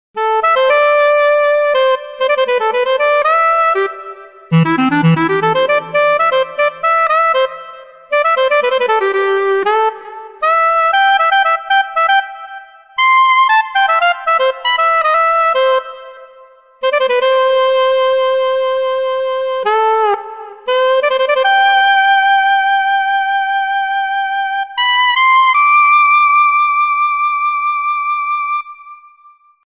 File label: clarinet; HALion6 : clarinet; Clarinet Solo